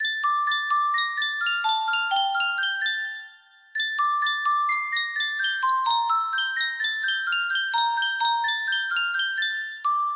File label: sankyo custom tune music box, music box melody